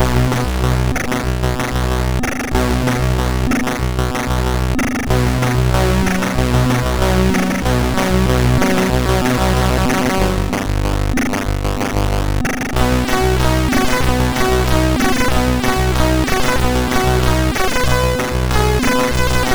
keyboarder tune, MOS6581; MOS6581R2

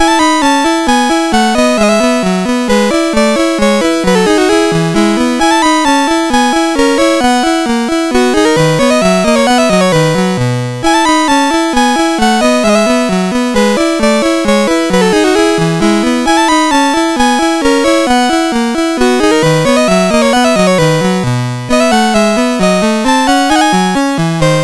poloniase, MOS6581R2; from 6th French Suite

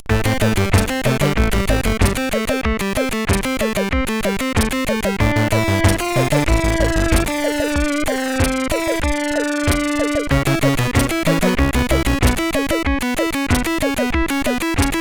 sid CSG8580R5

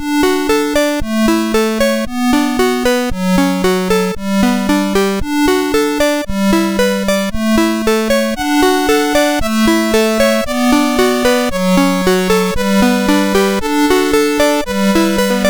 Pachelbel, MOS6581R4

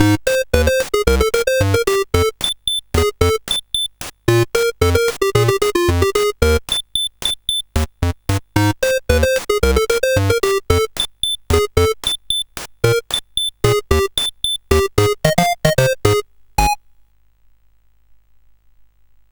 sid, CSG8580R5; Coco-Notes

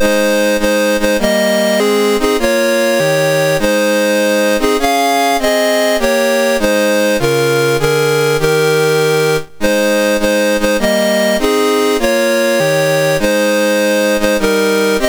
Ghana, National Anthems, MOS6581R2

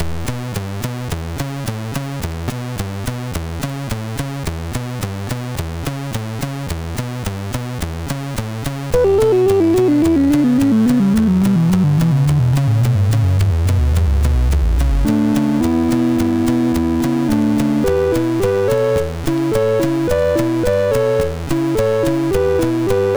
sid MOS6581R3

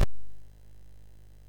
sid MOS6581R2; California Games

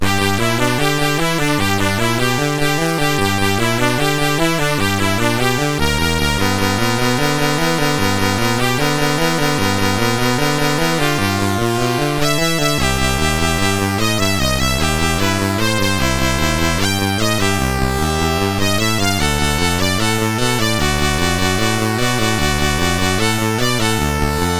Boogie Woogie Fiddle, MOS6581R2

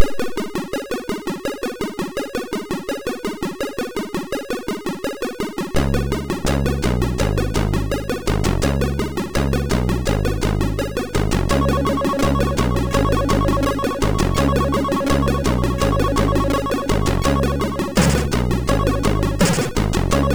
Beat Session music; MOS6581R2

